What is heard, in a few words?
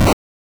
hit, attack sound; カタナ１